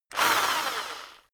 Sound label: rock drill rev medium short